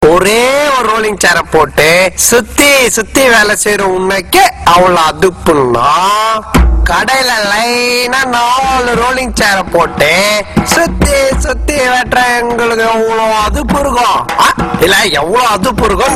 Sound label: Rollin chair